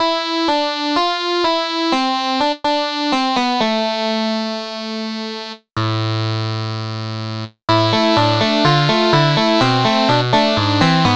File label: charang